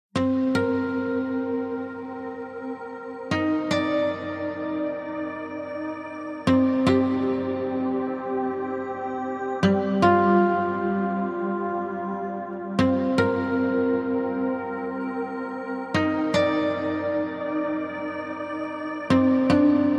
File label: Guitar Harmonics Only